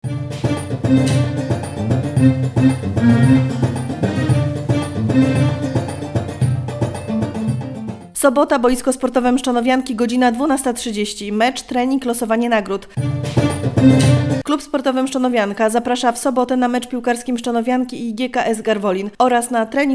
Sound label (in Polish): mecz tuba